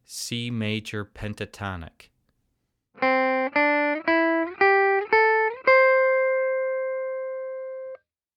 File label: Major Pentatonic